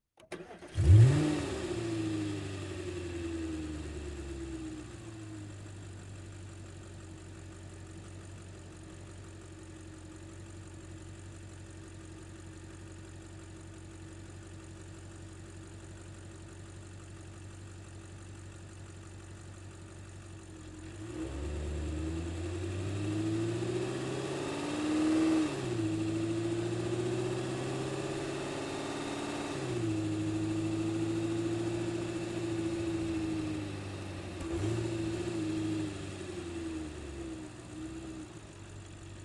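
audi rs4 onboard slow start drive stop reverse drive stop off engine mix; sonniss gdc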